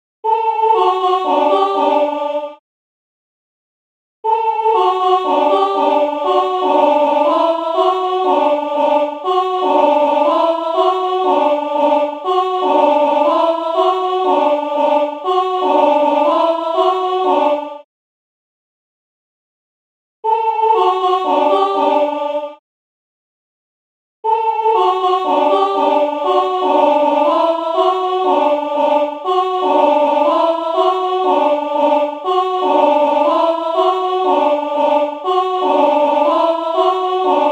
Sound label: alto; to i hola